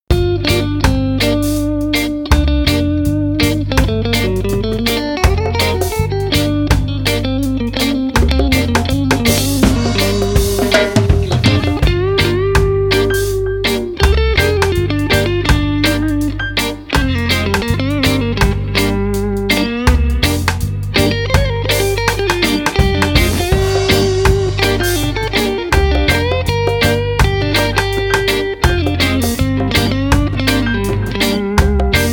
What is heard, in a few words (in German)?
abgedaempft neu mehr plock